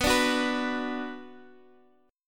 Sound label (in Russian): Boj Bm